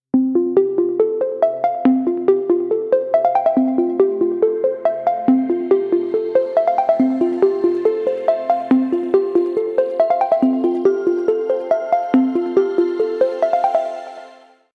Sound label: Airy, ringtones; Infinix; Note-Edge